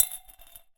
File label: Drop In Glass, Medium Wine Glass